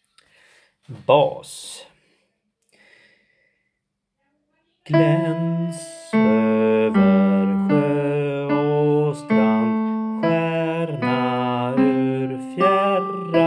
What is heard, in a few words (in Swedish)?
Bas